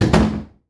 Door Slam Basement; household

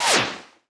Science Fiction Sci-Fi Laser Single Shot Short

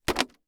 Impact Plastic Crunch; Impacts

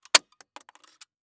Industry Tool Staple Gun Shoot Staple

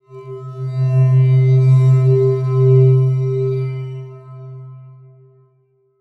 Transition Bell Swell; Adobe; Production Element

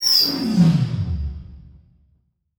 Transition Whoosh Fire

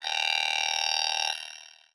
Title Transition Chopper

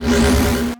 Science Fiction Sci-Fi Electronic Teleporting

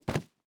Horror Impact Body Dropping On Concrete

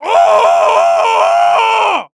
Cartoon Human Male Scream Very Frustrated Scream; AdobeCC

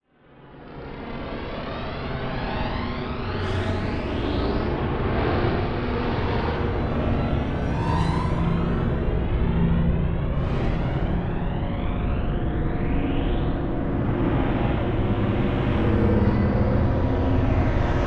Slow Phasey Pitch Swells; Module Production Element Title Imaging; Imaging Elements

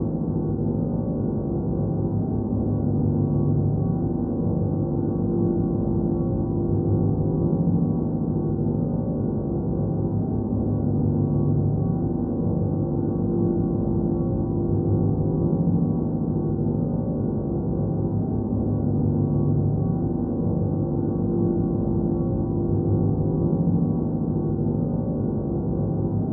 Drone Synth Square Wave Tone; Production Element Imaging; AdobeCC

